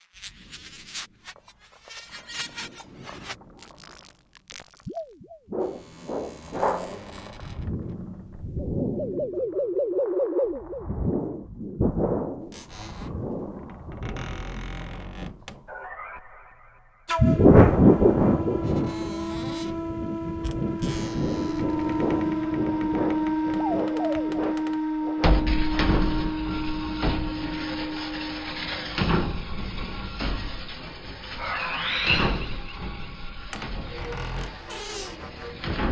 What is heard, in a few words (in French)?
station porte; dessin complexe; D Fortez